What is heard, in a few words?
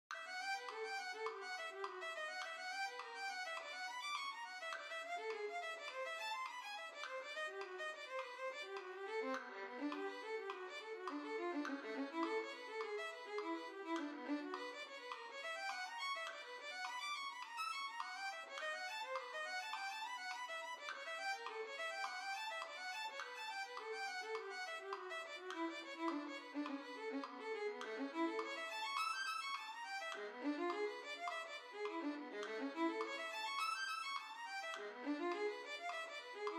Violin, Sym Orch, Kreutzer 29